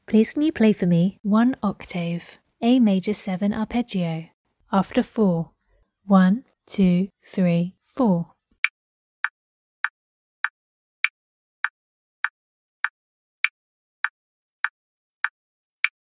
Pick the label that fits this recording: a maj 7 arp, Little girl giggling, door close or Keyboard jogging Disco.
a maj 7 arp